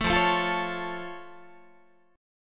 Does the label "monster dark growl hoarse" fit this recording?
No